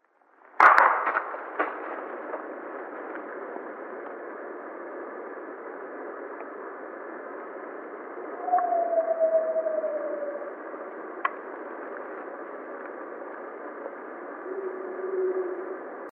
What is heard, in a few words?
howl